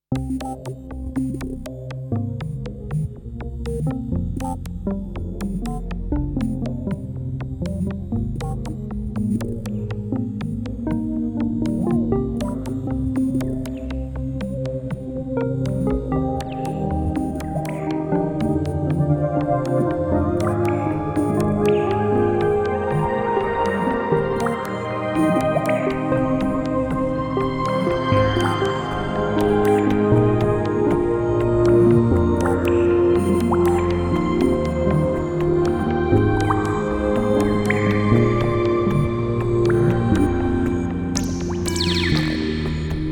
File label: mysterious and lost places mystically and enigmatic music with dripping water